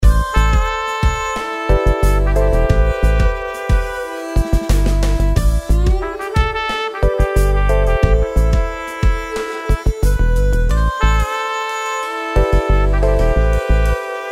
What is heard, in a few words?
chillout sample